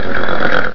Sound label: hurt Minotaur